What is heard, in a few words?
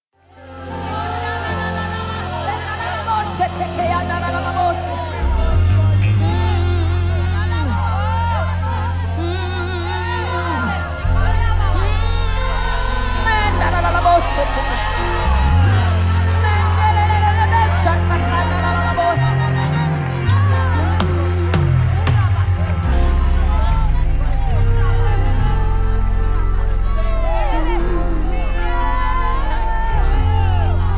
Instrumental Worship Live